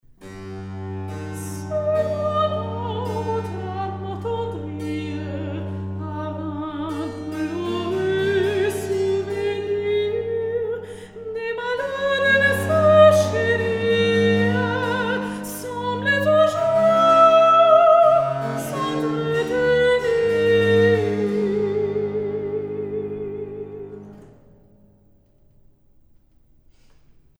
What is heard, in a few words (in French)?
Recitatif; Philomele